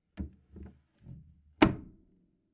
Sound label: Upright Piano Closing Lid